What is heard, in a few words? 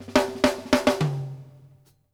off snare, drum fills